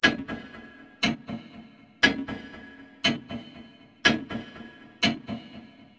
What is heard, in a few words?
timer; World of Tanks Blitz; GUI battle streamed